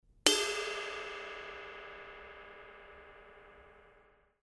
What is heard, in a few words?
K Con Ren Ride Bell, cymbal sound